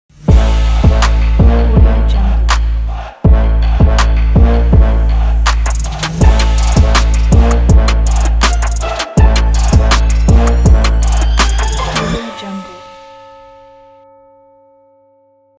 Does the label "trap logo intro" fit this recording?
Yes